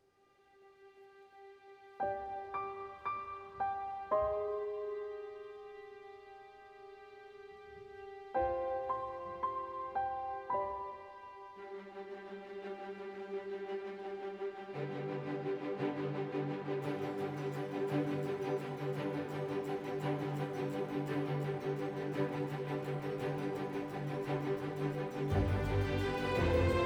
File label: Village 85bpm 3-8meter; Planet of Lana; Tailo Village A